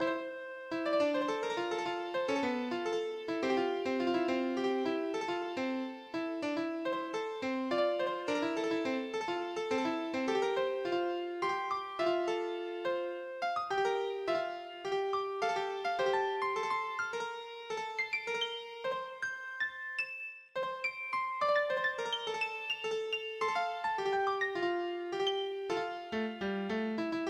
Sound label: piano contemporary; midi; traditional